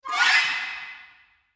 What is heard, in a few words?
short gliss up